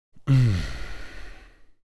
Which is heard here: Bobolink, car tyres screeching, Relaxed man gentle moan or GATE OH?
Relaxed man gentle moan